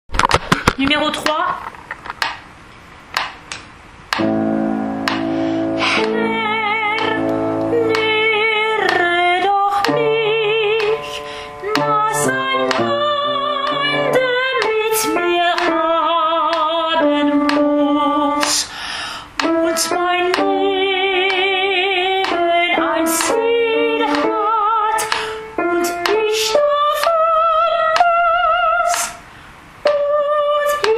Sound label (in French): Brahms requiem allemand soprano; lettre A